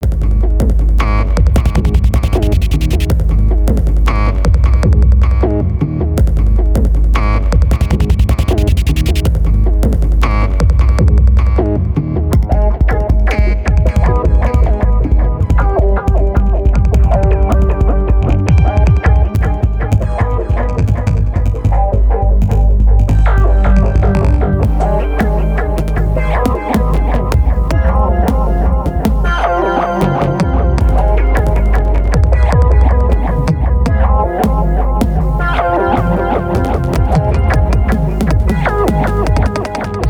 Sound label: Quirky Dark Stutter Glitch; CUES for film TV games etc